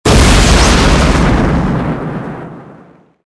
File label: explosion